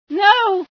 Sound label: scream, female sci